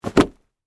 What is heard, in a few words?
Carpet Roll Drop, Foley, Carpet, Roll, Drop, On, Cement; Drops; Objects; Blast Wave